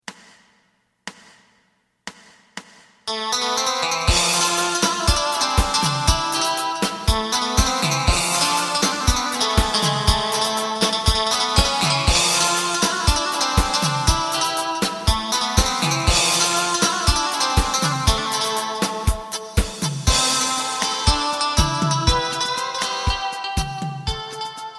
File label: bass